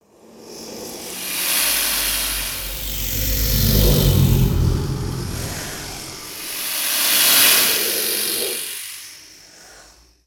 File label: Serpent Hiss Loop Mono; destructibles